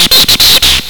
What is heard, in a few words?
Tekno Squeel